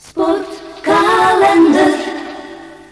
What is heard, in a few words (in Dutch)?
Jingle zang a-capella; Sportkalender